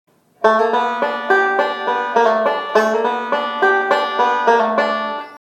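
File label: lick 3-2 pull-off variation, banjo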